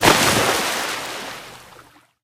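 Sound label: explosion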